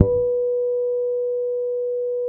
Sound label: bass, B 4; Spectrasonics; Bass Legends CD 1